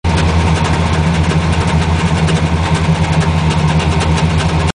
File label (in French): v8 ralenti oldsmobile